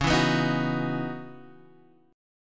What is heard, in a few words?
Db Minor 11th Db